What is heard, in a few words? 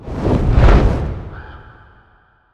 big Win Raven Fly Away; netent